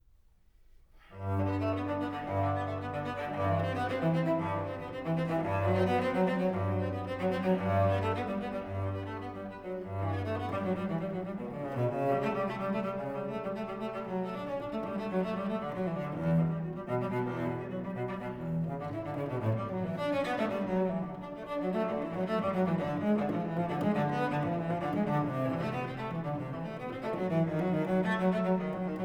Prelude from Suite for Cello solo No.1 (BWV.1007) in G major; J.S. Bach